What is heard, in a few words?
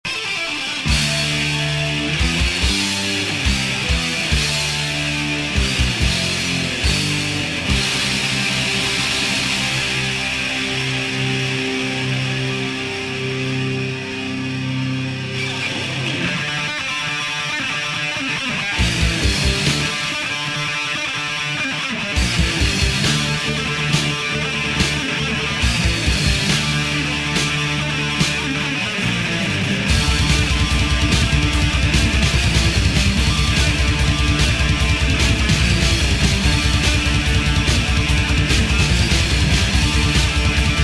Death Metal